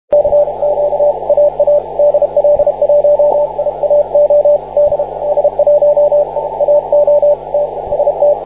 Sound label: cw; AA CW - 15M at DJ1YFK; Backscatter on his Doublet.